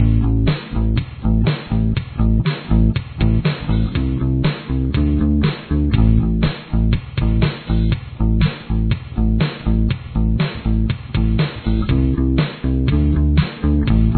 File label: verse bass